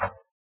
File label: bump; There; ThereClient